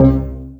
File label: INFO BEEP; GTA IV; LCPDFR